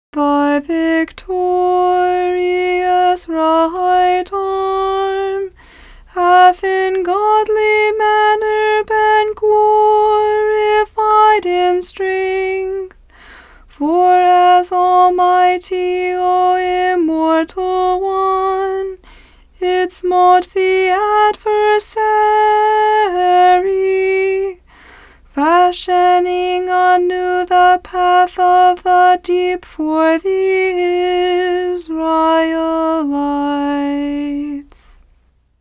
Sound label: Irmos (Melody); Tone 1